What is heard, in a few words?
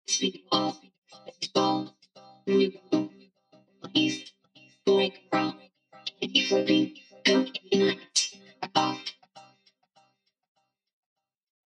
electronic, 100, Cm, Acapellas